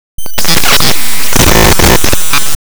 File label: glitch